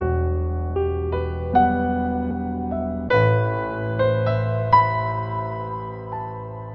piano, Loops, Sad

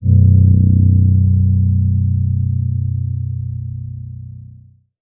rumbler, Bass

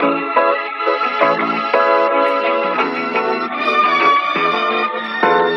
strings, Loops; Phonky

